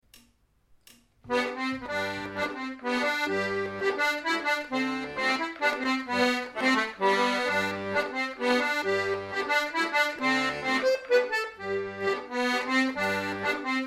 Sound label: mazurka, danses folk; Danses folk et danses d'ailleurs